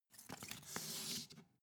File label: take book soft tonal